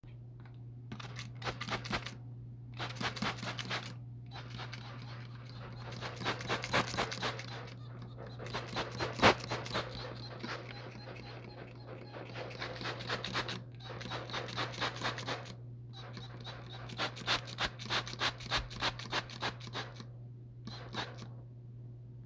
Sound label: spray